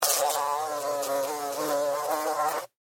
Fly Take Off Land, Animal, Fly, Take, Off, Land, Buzz, Wings, Insect, Bug; Hornet Sound Effects; BlastWave